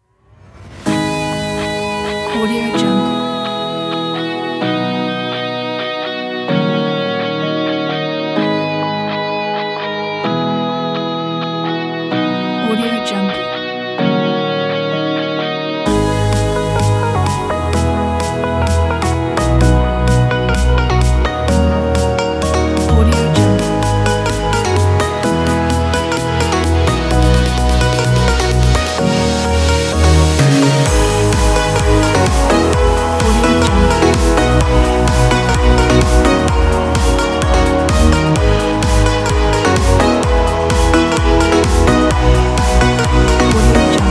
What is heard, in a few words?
summer progressive house